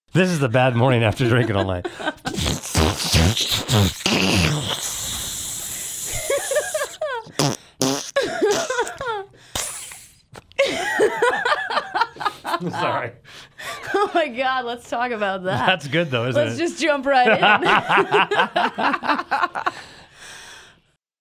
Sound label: Interview Fart Noises